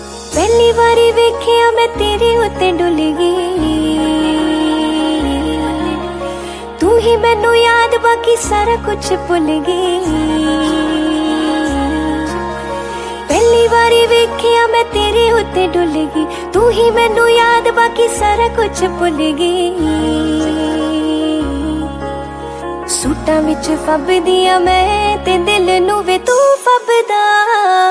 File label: Punjabi Song Ringtone